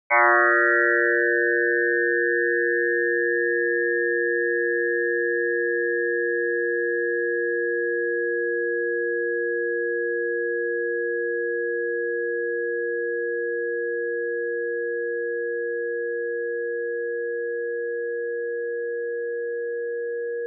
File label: gong; graz